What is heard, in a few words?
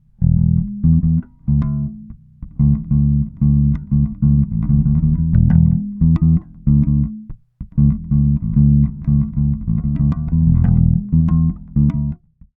E Bass Slaped